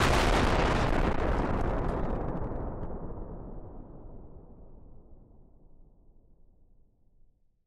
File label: Explosion Blast Distorted; SoundsCrate